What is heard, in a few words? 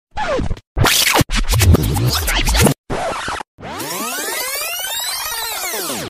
tape rewind